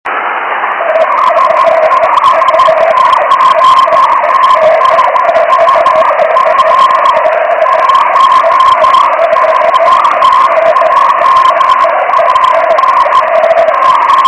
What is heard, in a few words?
lowspread CW, EME; DL0SHF